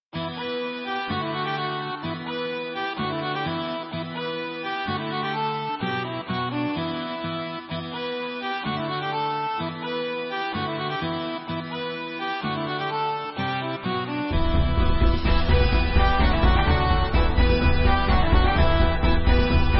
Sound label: Instrumental; Machol Czechia; Boaz Cohen